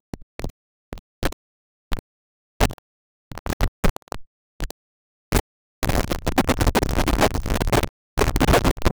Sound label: the loud distorted sound of a record scratch interrupting music